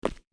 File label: marble, Footstep